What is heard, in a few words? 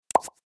notification